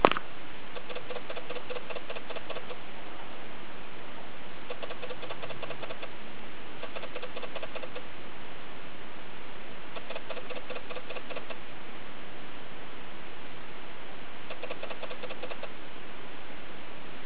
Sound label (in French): MESANGE noix